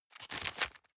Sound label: plastic bag small turn medium